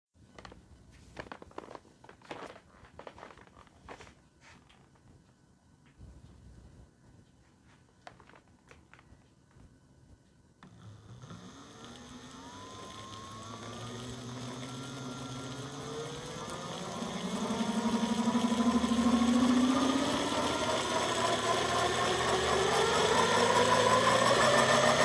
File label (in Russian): zvuk motora smazka mobil xhp 222, fatbike